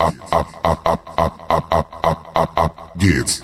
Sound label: germanic chant; Drum loops